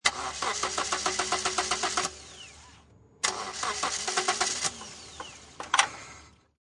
Car Low Battery, Car, attempt to start, low battery - sputters; Cars; Vehicles